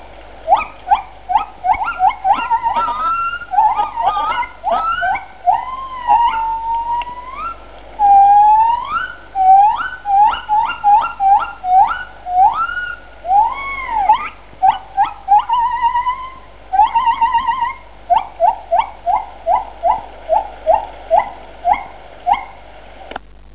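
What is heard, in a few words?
Male Female, gibbons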